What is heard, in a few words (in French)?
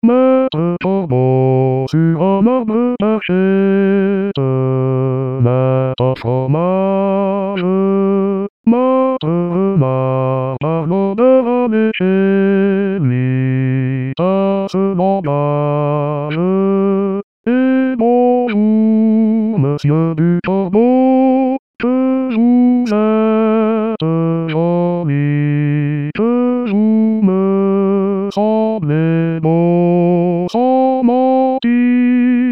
Le Corbeau et le Renard Basse, Chorale